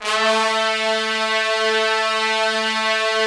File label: Trumpets; Roland - LA Composer vol. 4